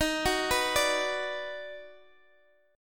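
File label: E flat Minor Major 7th Sharp 5th, Guitar, DADGAD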